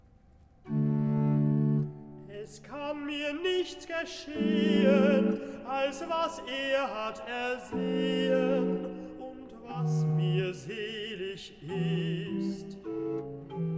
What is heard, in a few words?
Recitativo Tenore; Bach Johann Sebastian; BWV0097; In Allen Meinen Taten; Es Kann Mir Nicht Geschehen; Kantate Ohne Bestimmung